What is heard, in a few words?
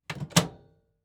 microwave oven close